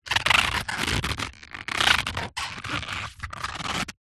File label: food bannanas rub together creaks squeaks